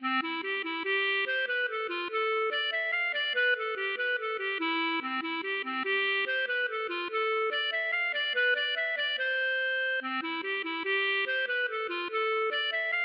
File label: mazurka